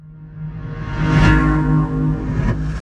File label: Epic Pluck; Zero-G; Soundclash Nu Dance Electro; Acidized Wavs; FX Atmospheres